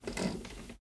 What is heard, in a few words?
run wood creaky